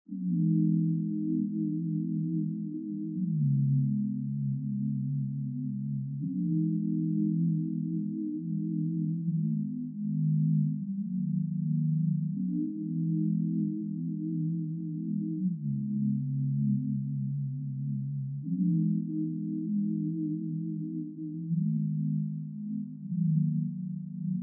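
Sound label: E minor 157 BPM Pad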